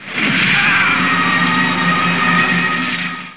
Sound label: player dead; Vitamin